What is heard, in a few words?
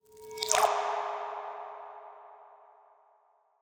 water swell; soundscrate